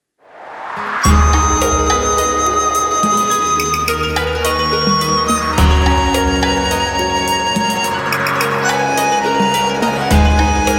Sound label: beat, playback